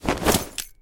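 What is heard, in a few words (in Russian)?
негромкий шум брошенного рюкзака на сиденье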